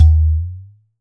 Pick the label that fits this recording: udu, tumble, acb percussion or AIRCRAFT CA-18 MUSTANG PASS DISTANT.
udu